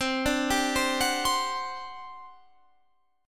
G Major 7th Suspended 4th, C

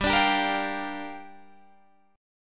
G Minor 7th, Guitar, Half Step Down

